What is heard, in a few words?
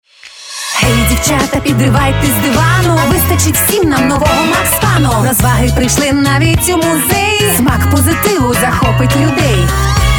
vocal